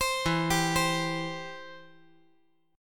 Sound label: E Minor Sharp 5th E down